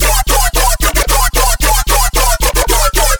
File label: bass loop 150 E